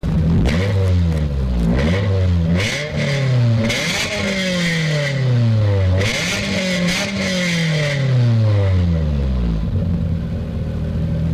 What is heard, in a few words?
rev at exhaust tip